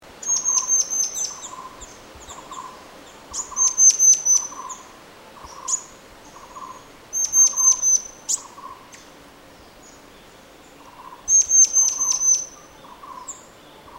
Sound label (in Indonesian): burung murai besi murai cai murai air mancur